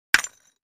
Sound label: Brick Hit Hammer